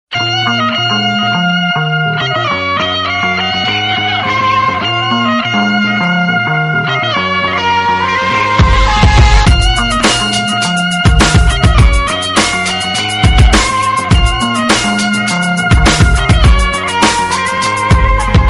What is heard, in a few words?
English Tone